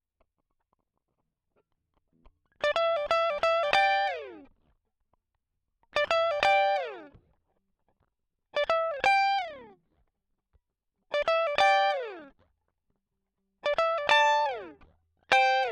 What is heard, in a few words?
Guit Solo Line